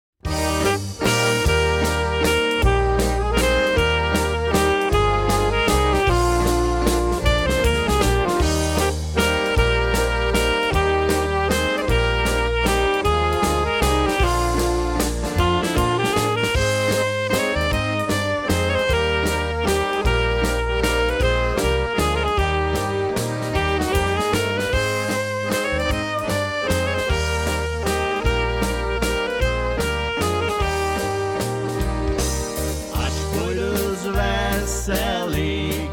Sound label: Waltz